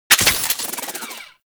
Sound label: Shotgun Glaive Return Mech; Warframe; Tenno; Alchemist